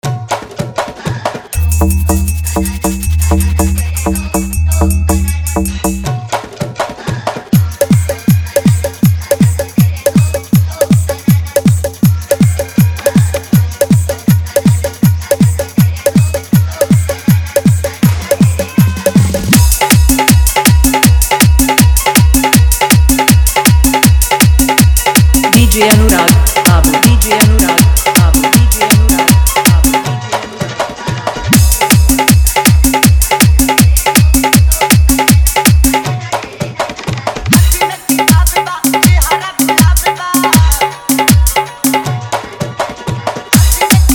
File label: Desi Style Dhollki Bass Mix